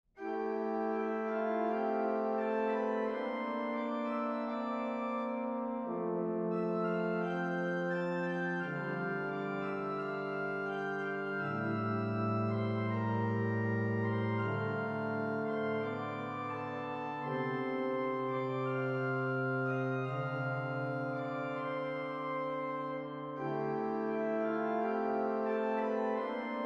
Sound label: toccata, organist